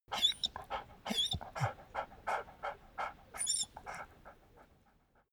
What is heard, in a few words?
dog squeak pant; natur-tiere; hunde